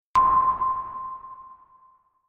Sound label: sonar high